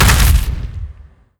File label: body impact soft, antlion; creatures